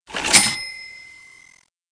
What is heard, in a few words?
Cash; Learn to Fly 3